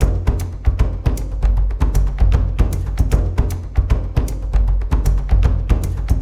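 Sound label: Shooting gallery post loop; Gnarled Forest; lego universe macos windows gamerip 2010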